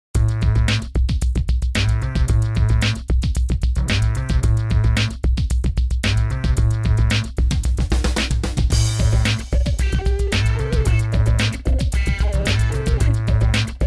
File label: Fog Horns 112